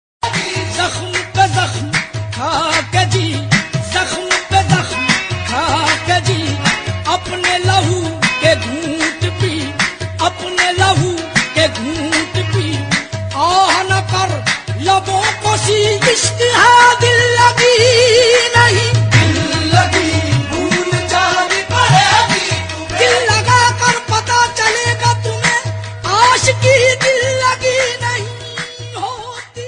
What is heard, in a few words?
pakistani ringtone